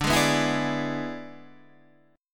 E 7th D, guitar

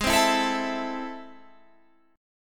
A Minor G sharp, Am/G# chord; strummed

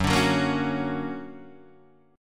D Augmented Major 7th Gb, guitar

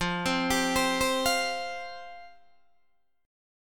F Suspended 2nd F Guitar DADGAD

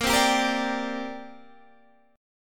C 7th Suspended 2nd Bb

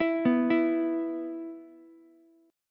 E 5th, Ukulele; arpeggiated; JGuitar

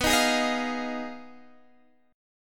Ab Minor 6th B

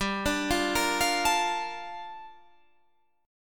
Bb Major 7th, G; Bbmaj7/G arpeggiated

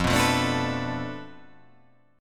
F 6th Flat 5th F down, chord

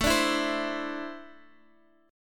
D 7th Suspended 2nd, chord

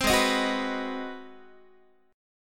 G sharp 7th Sharp 9th, Guitar; G#7#9; 15,16,18,16,15,x; strummed

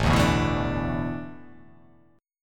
A Suspended 2nd Suspended 4th; Asus2sus4 strummed

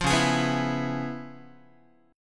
C sharp 7th Sharp 9th Guitar, chord; strummed; C#7#9; JGuitar

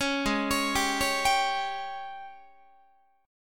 G sharp Major 7th Suspended 4th, Guitar; arpeggiated; 16,16,16,17,17,18; 4A,4E,5A,5D,5G#,6C#; G#M7sus4

